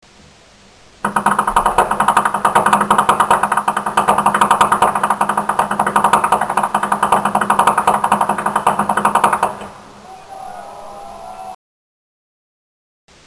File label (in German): schnalzen; siezenheim